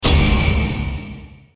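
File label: scary sound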